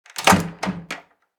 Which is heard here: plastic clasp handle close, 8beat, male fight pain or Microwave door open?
Microwave door open